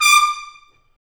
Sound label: BRS TP, Roland, Stabs; Roland L-CDX-03